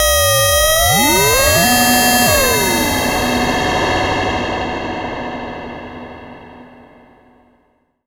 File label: Ambient Ring Mod; Trance Formation